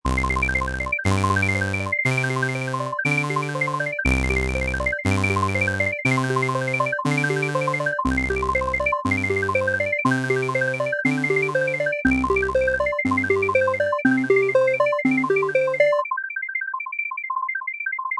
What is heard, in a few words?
Arps; jmusic; jmtutorial